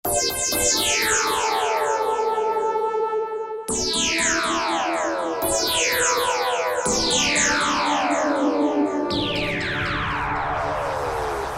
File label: falling star; Roland SRX-05 Supreme Dance